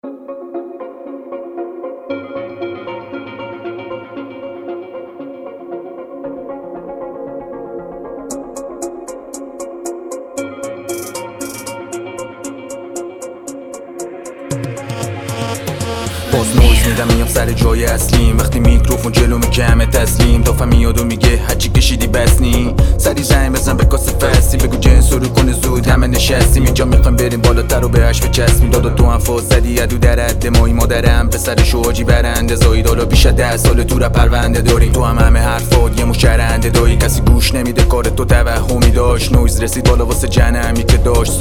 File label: Techno; Techno Game